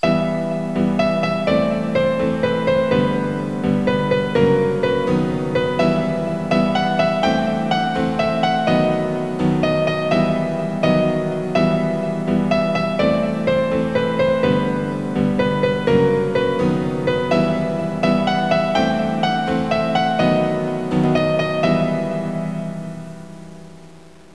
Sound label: piano